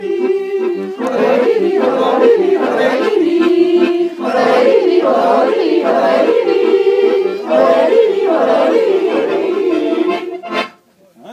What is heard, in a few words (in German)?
jodler; jodelseminar